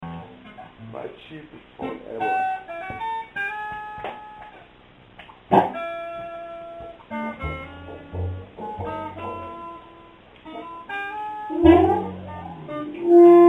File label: Blues and bass